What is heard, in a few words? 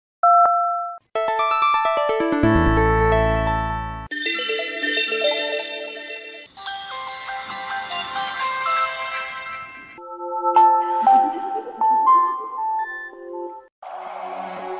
samsung ringtone evolution